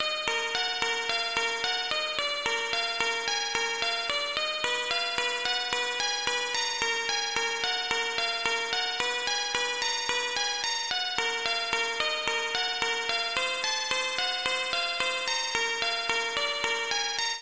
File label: Instrument Guitar; Super FNaF